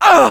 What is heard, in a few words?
pain sharp, fr; Scout